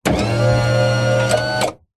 hospital bed electronic motorized lift whirr

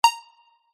multimedia game sound synth digital tone beep

